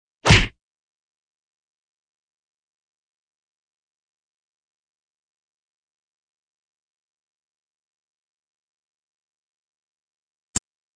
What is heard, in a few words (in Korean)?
Fights, 싸움-전투; 헐리웃특수효과음